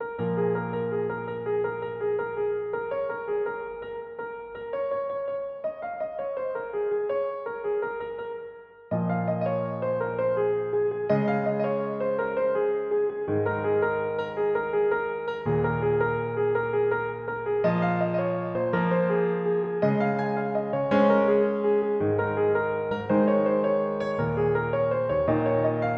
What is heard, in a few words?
Adv Piano Solo